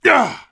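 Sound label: ATTACK; Star Wars Knights Of The Old Republic I; XBOX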